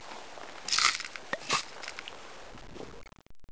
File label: pills use